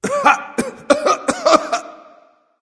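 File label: non-verbal clear throat; smite; voicepack; houyi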